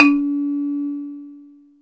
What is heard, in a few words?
XYLO WAVE